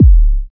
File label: hot kick single hit d key, kick one shot tuned to the D note